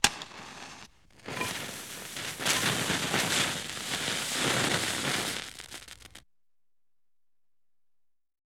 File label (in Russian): zvuki bomby i granaty